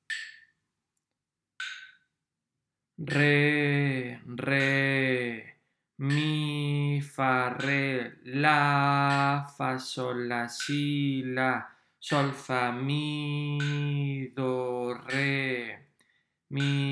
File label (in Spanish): RITMO